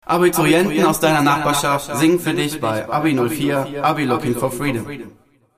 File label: Vocals